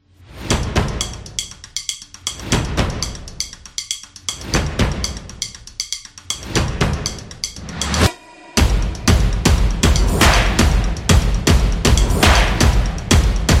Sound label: Sport Beat; sport drums